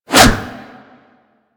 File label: Staff Swing F; Warframe